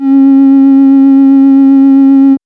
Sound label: Moog C 2 -R; AMG - Vince Clarke Lucky Bastard